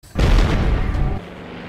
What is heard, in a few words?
gun firing; gustav